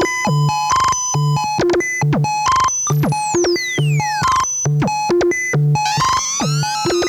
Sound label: Pro One Loop, Keyboards